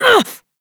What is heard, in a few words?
androgynous exertion; Warframe; The New War